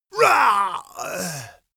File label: male death; schreie; menschen; soundpool